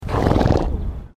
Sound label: horse blow; stephan schutze